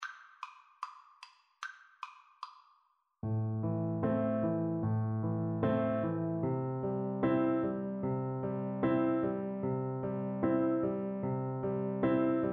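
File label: el condor passa, marimba, Percussion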